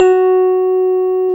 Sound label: piano Rhodes; Sound & Vision; Gigapack I CD 2; PIA E-PIANOS